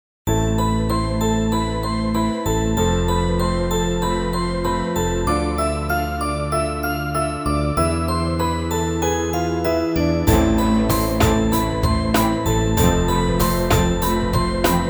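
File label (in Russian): эфирная атмосфера открытия шокирующий короткий мелодичный фон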